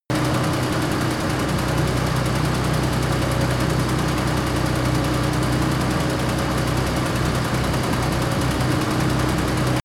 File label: tank small engine medium steady